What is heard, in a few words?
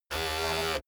servo steady